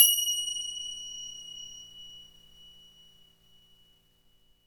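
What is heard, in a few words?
finger cymbal, Synclavier Percussion, World & Orchestral; ILIO; Synclavier Percussion 2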